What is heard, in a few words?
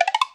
ascending